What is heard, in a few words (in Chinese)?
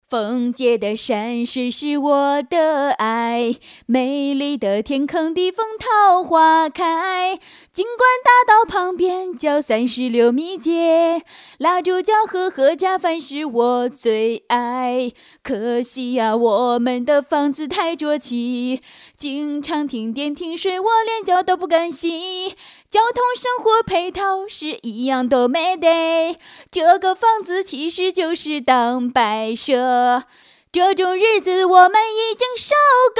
女 童声演唱 楼盘翻唱; 国语女声配音